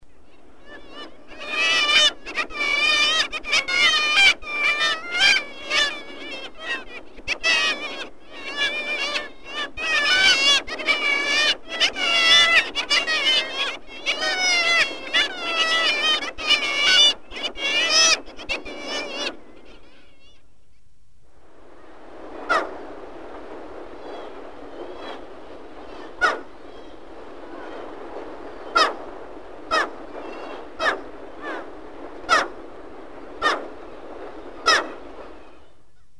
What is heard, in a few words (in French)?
Mouette; Sons Oiseaux